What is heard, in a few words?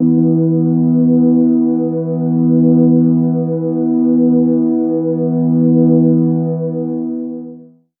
pad b3